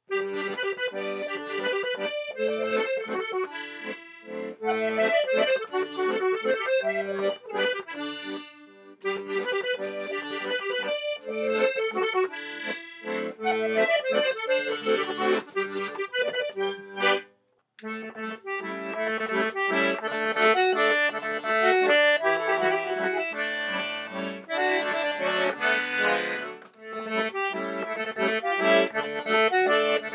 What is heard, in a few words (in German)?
SCHOLER walzer